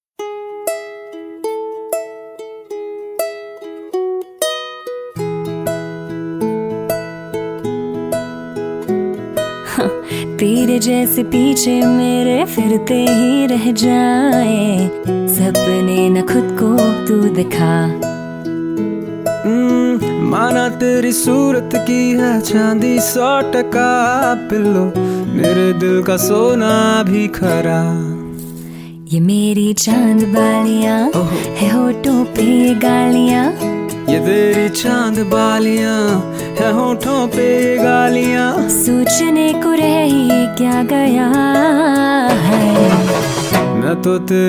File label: Duet